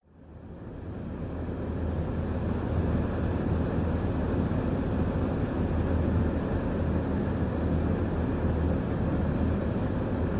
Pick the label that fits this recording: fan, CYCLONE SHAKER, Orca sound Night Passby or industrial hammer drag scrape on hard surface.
fan